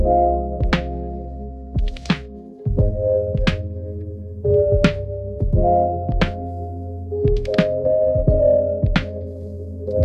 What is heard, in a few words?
mellow piano vinyl dreams, ringtones